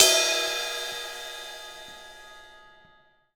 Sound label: Ride Cymbal; Essential Percussion